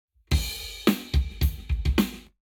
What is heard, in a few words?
Lab Wet Drums 0deg